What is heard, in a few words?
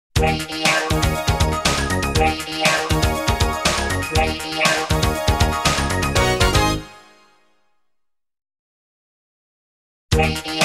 Station Call, Sounders, Bumpers; Sounders, Bumpers, CUES & IDENTS; DennisMusicLibrary